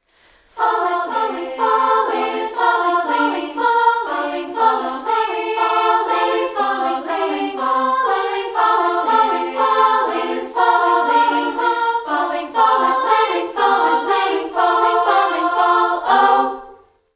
Fall Is Falling A Cap

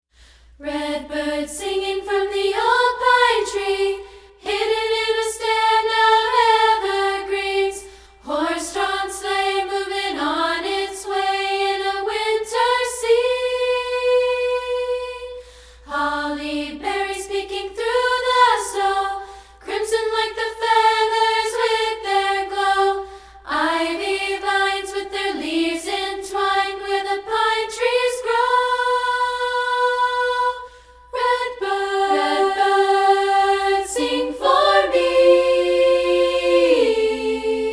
A Cappella

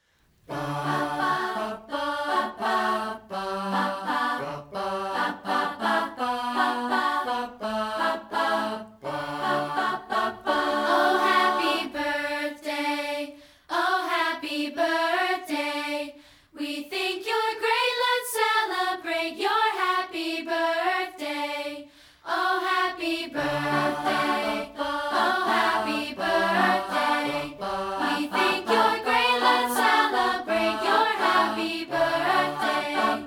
Happy Birthday A Cappella

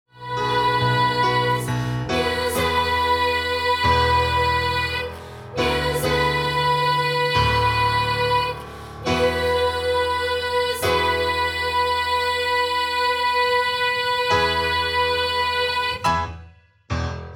Music Matters Top Note

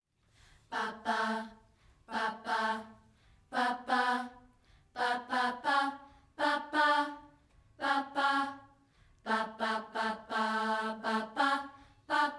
Oh Happy Birthday Lower

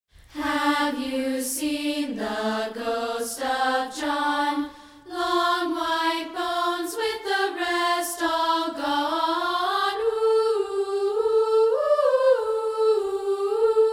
A Cappella

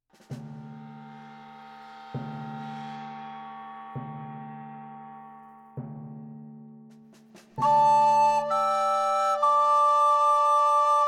Recorders Only

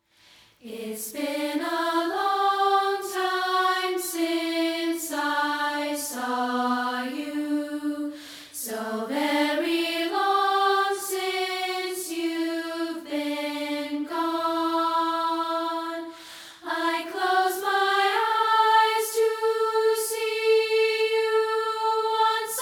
Long Time a cappella

